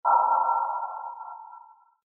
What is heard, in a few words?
sub Ping; jetpackLearningPlatform; bubble-trouble